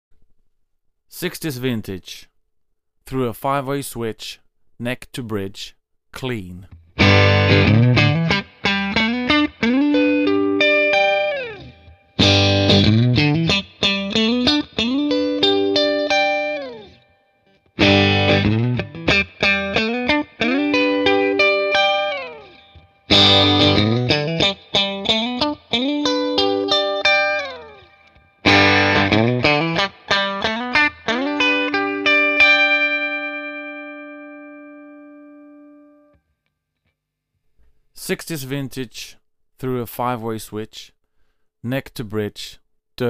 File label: 60s Vintage, strat pickup bridge; lundgren